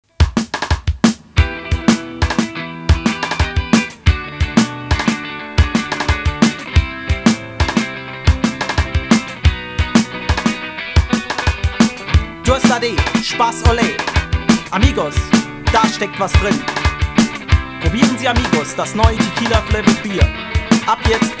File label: Jingle; Amigos